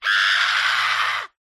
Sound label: attack scream vowel high pitched; lukas tvrdon